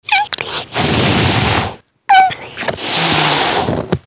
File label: hissing very loud; isis